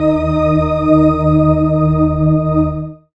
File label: Dark String, Pads; Zero-G Jungle Warfare 1, 2 & 3; 90 s Sample CDs